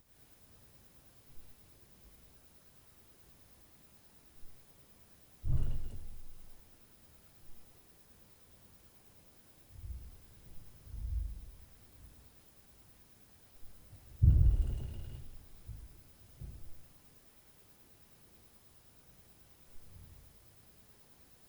Upstair Boom; Night Noise

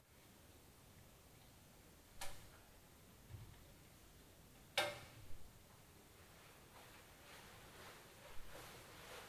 RAIN ON HEATING, Night Noise

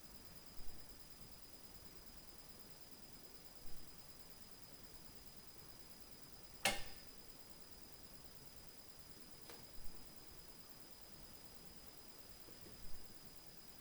TAC very Close, Night Noise, Beast Noise

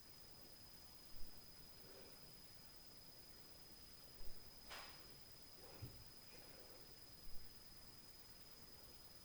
TAK, Night Noise, Beast Noise

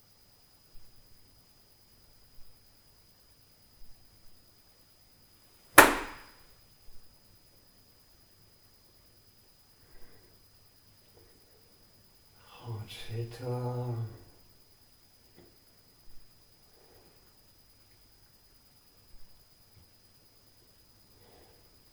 SUPER TAC NOISE, Night Noise

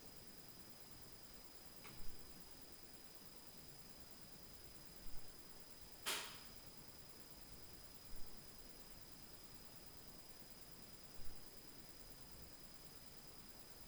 Sleep + TAK DESK

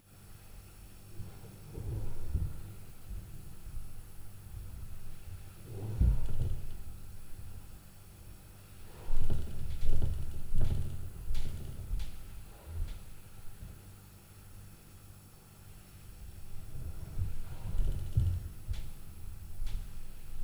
Night Noise; LF Upstair

